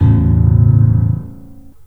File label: The Piano; LOHARM; InVision Interactive